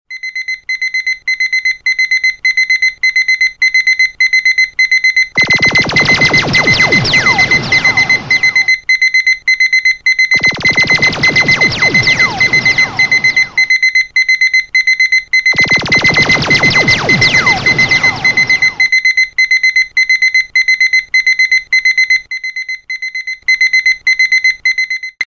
Wake Up Clock Alarm

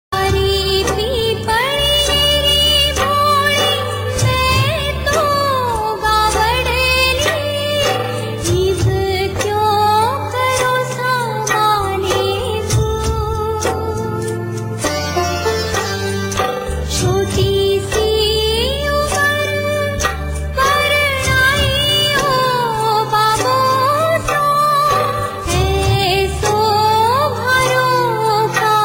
Rajasthani Song

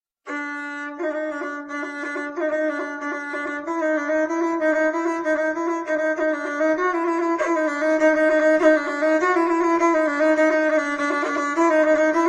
Guslar

